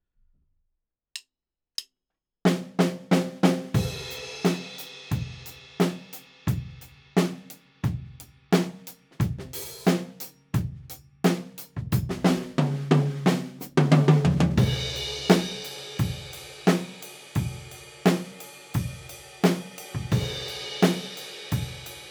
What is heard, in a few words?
Top XY Far